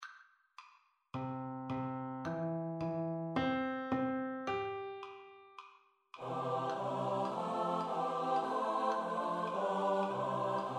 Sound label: SATB Study